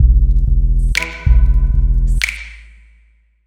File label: Beach beat